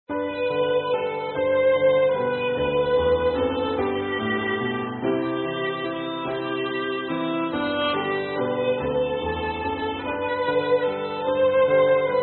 Trusting Jesus, Instrumental Hymns

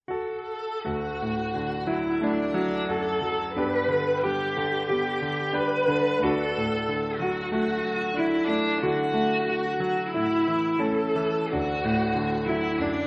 He Leadeth Me, Instrumental Hymns

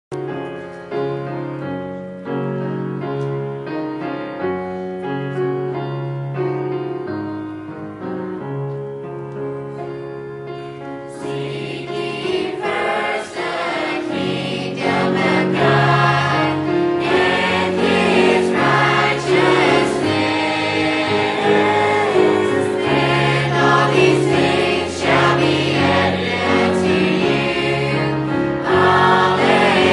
Youth Choir; Special Singing at EBC

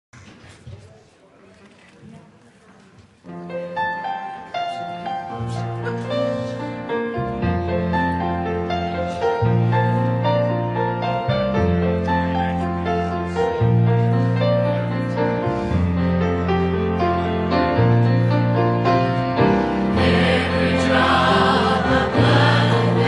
choir; Emmanuel Baptist Adult Choir; Jesus Saves

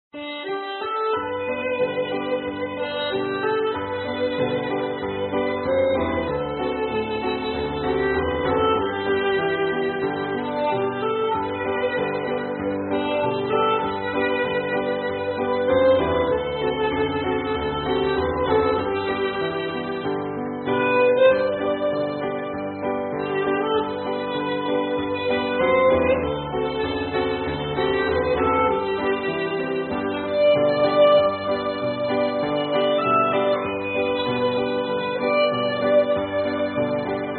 O Happy Day, Instrumental Hymns

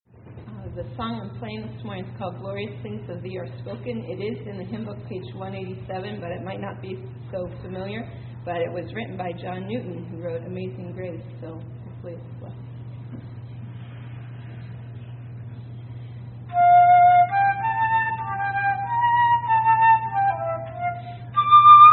Glorious Things of Thee Are Spoken - Flute; Special Music

